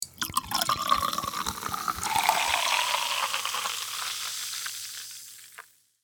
Fizzing soda pour bubbles cola; liquid

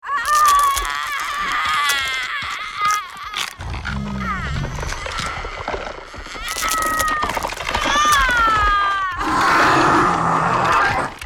Werewolf transformation female

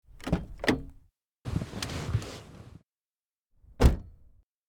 Car interior perspective door opening and closing

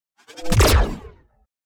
Sci fi weapon plasma sniper rifle single shooting

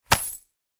Money pouch hand catch; purse

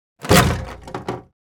Large object drop heavy plastic impact; gfxsounds

